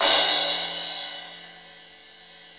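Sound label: cymbal crash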